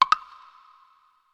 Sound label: match join; Luxariah Default Minimal